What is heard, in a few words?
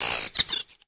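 Giant Red Condor damage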